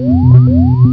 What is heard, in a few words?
FLUX BOIL; EFFECTS